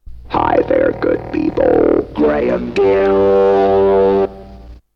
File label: jingle